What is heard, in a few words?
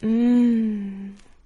mmm sexy; ooh ahh